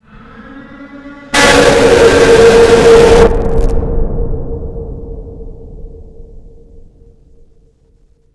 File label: jumpscare; slender skinwalker; fleshgait